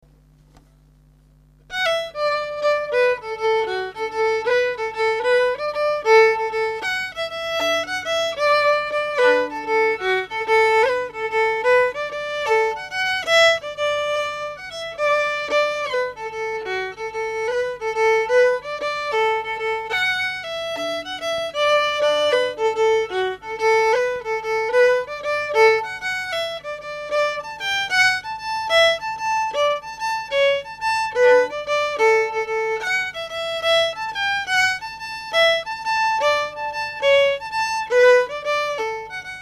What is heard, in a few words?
jig